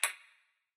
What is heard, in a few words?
Bullet Bounce